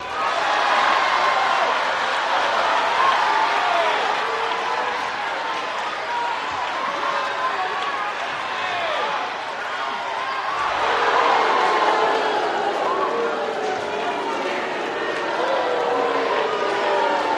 Crowd Walla, boxing

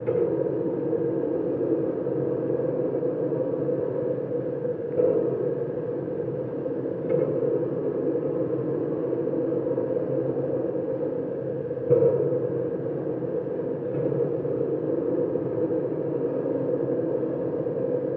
Ambience Machine St; drones & tones

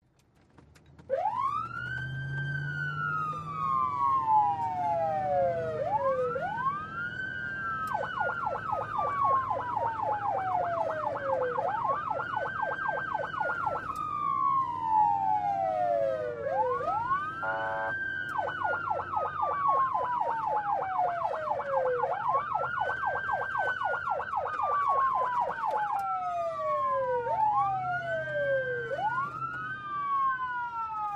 Ambulance Interior, ambulance

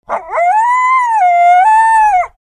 Coyote Howl, Single. Medium Perspective.

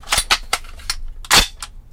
Uzi Metal Mvmt Cocks

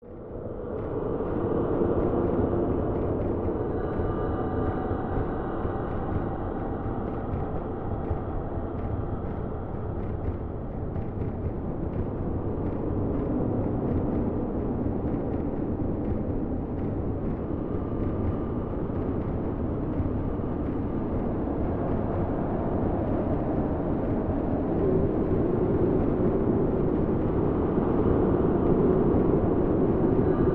Narrow Wind Tunnel A, ambience

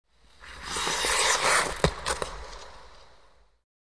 Ice Skating Smooth

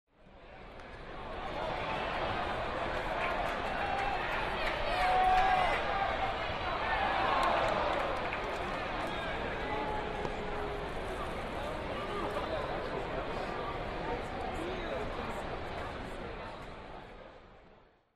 Large Crowd Idle With Small Swell

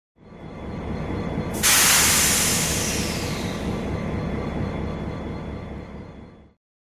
Train Loud Long Steam, steam train

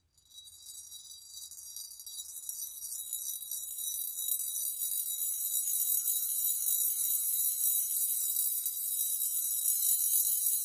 BBs Vibrate In Bowed Bowl As It Is Bowed; bowed metal; Brass Bowl

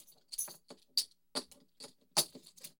Tokens Jingle Metal

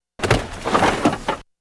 Bed Squeak Softer; bed movement

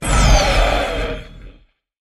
T Rex Monster Scary Roar

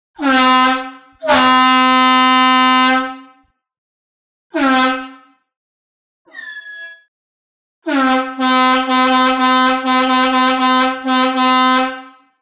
Horn Juggernaught Ho

